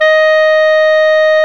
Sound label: Clarinets